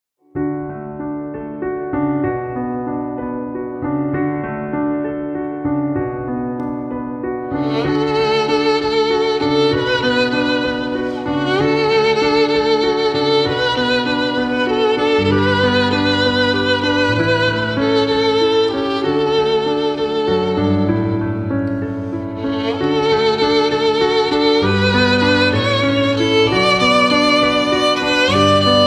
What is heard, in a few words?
Hallelujah Violine